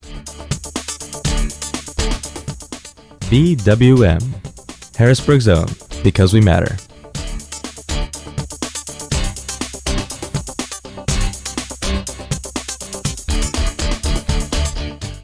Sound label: Jingle; BWM Radio Harrisburgs Own